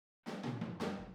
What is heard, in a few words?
Boogaloo - Room ST, 82 BPM; Drums; Intro; Sonic Foundry; Drums from the Big Room The Mixes